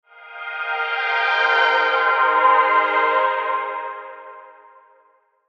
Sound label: Logo Swell Musical Harmony; Sonic Logo Sound Effects; Production Element Imaging Element Accent Transition